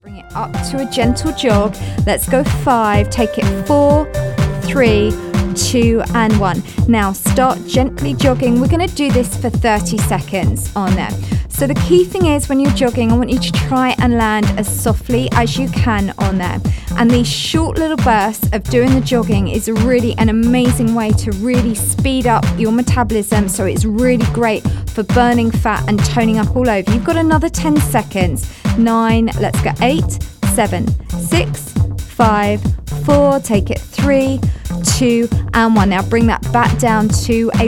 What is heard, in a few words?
jog example; beginners running workout